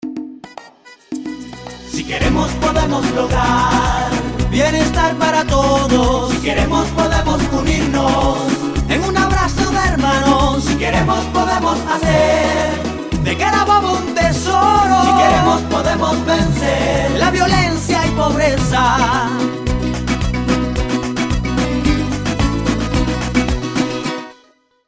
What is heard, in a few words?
jingle; Jingle Político si podemos